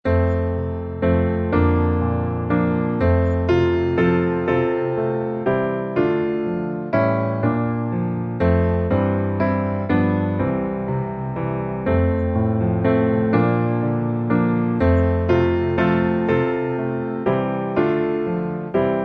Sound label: piano